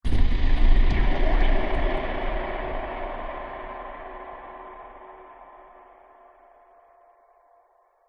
sound design cinematic hit boom lfe bright airy tail; zapsplat